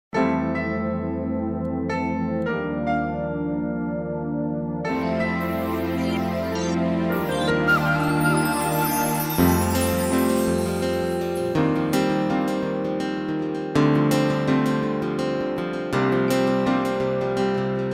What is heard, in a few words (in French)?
balad tram train playback